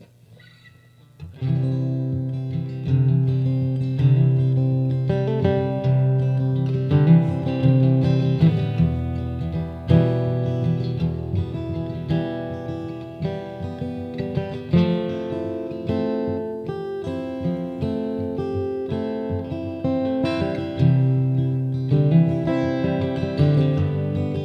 Jam

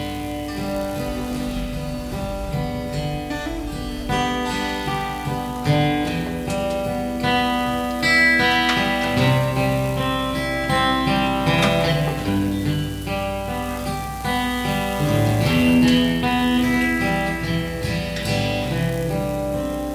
North Country Jam

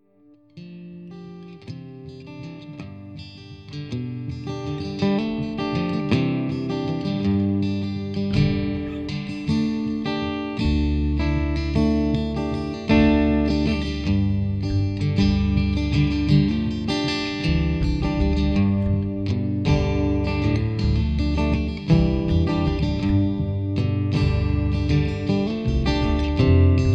Jam; r1 studio sessions

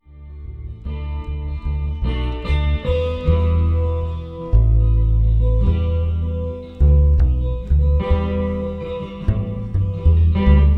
Jam; r1 studio sessions

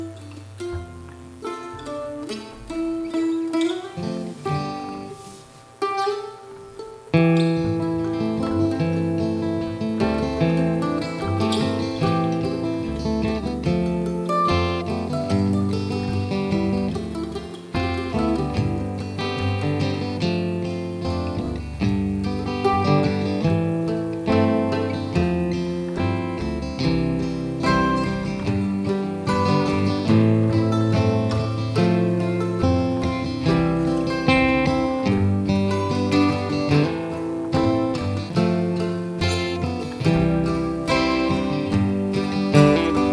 Mando; r1 studio sessions

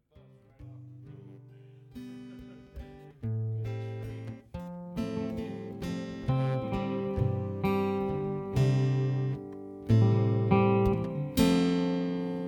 Jam; r1 studio sessions

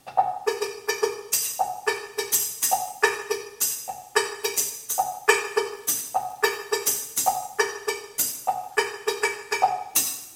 Perc; r1 studio sessions

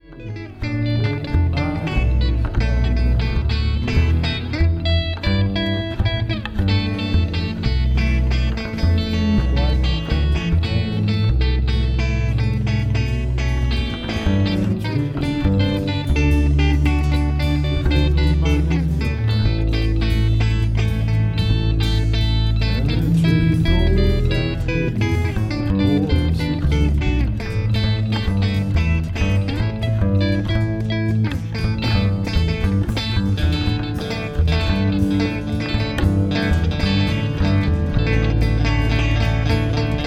Jam

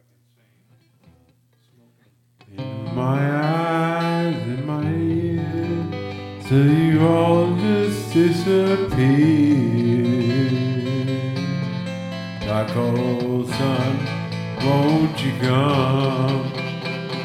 Jam; r1 studio sessions

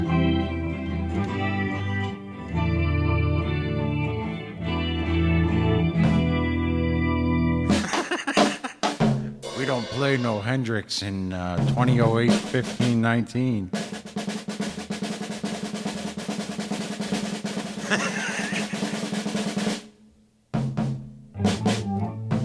Swingin' Blues

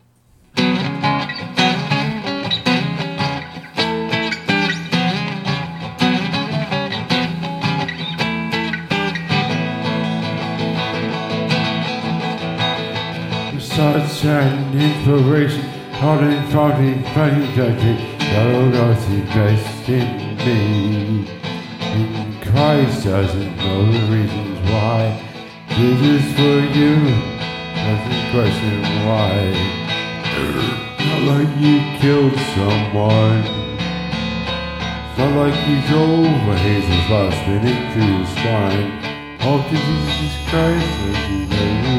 Jam; r1 studio sessions

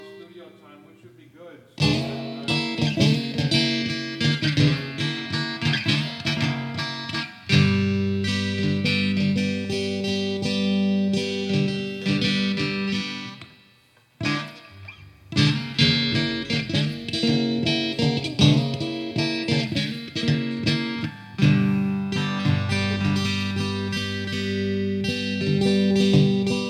Jam; r1 studio sessions

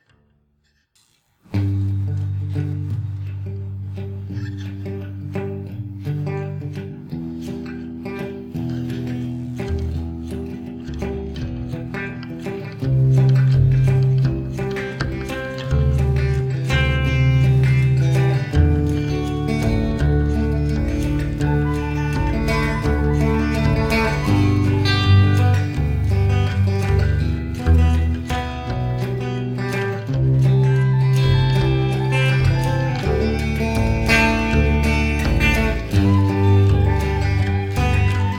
Rainbow Instrumental

